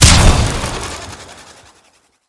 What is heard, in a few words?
frost spear; skyrim